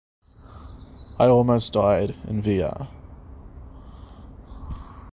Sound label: cringe